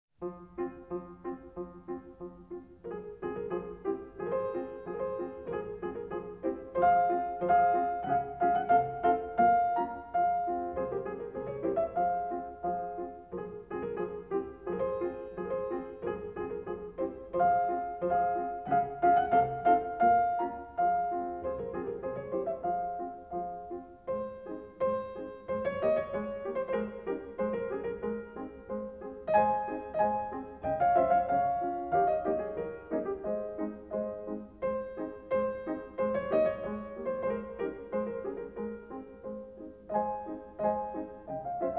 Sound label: Moments Musicaux 3 F Minor (Allegro Moderato), Piano works; Schubert